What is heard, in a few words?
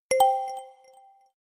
marbles